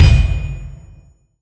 mp reward; Enhanced Graphical User Interface

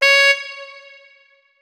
notes, saxophone; Hideout Furniture

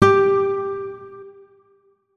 notes, guitar; Hideout Furniture

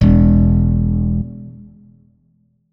notes, guitar; Hideout Furniture

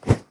knife attack; Barrys Better Knives; weapons

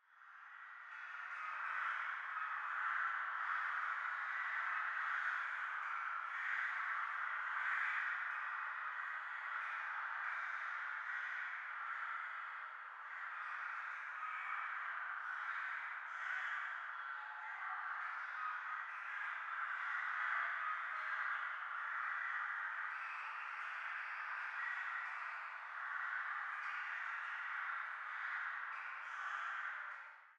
prettyfar, ambient blowout; NPCs Die in Emissions

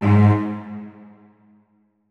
notes, keyboard; Hideout Furniture

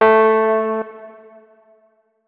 notes, keyboard, electric piano

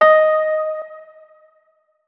notes, keyboard, electric piano

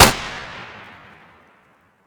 m1a1, weapons thompson; Tommy Gun; Tommy Gun Drop

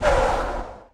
growl, Angry Chimera Growls; TBs Angry Chimera Growls

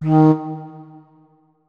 flute notes; Hideout Furniture

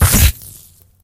tele damage, poltergeist; Soundscape Overhaul; monsters

